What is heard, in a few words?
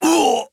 Recoil; Puzzle Chronicles; PS3; PSP; X360; SFX 3D Diraqiguard